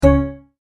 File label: Multimedia Tone-Stop